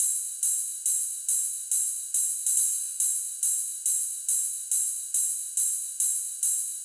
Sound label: 140 House Ride; Dance DJ; Drums; Cymbals; Digidesign; Pro Tools; Pro Tools Essential Loops